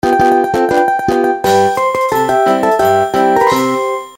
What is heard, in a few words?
Retro Power Up; LG U370 Disney Mobile; On / Off Sounds